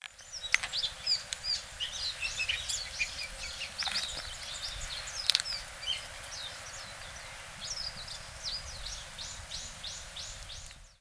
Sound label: kosamebitaki, bird song